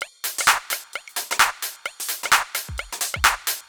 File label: Drum Loop 130, Downtown House; Drum Loops 130bpm